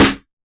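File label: hip hop snare; Dr 202 Kit 1; Percussion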